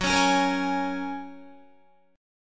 F sharp Major Add 9th F sharp, Guitar; F#add9 strummed; 13,16,13,15,13,16; 4F,4A,5C,5G,5C,6E; chord shape

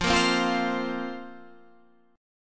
F Suspended 4th F

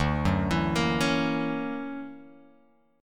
D Augmented Major 9th Guitar Open F, chord; 2,1,4,5,4,x; arpeggiated; JGuitar

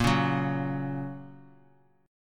A sharp Minor Sharp 5th; x,13,11,11; strummed; JGuitar

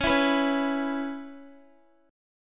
C sharp Minor 7th, Guitar; C#m7; strummed; x,16,16,16,13,x

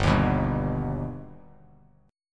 G Augmented, Bass Standard; G+ strummed; 3,6,9,0; JGuitar

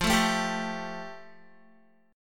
F Major Add 9th, Guitar; strummed

